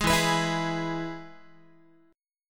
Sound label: E-Major E, Guitar; strummed; JGuitar